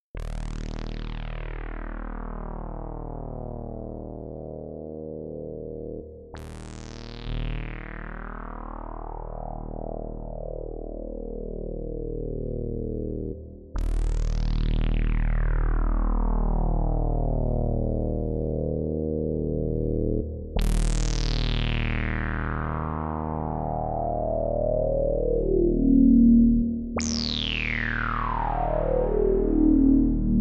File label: Nord Stage Saw Filter Resonance